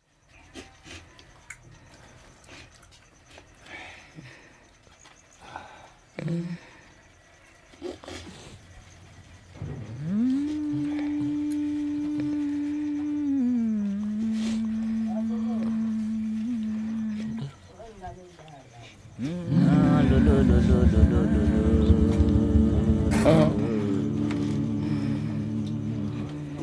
african feedback; soundscape; alessandro Bosetti

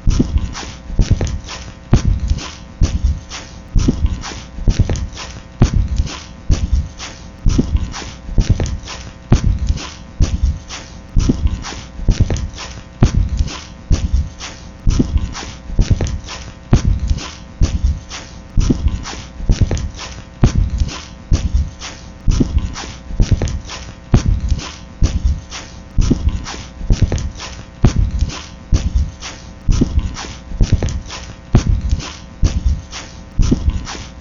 dog after; soundscape

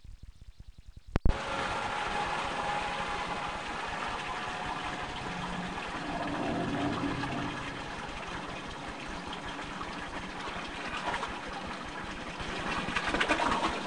water flow, sea

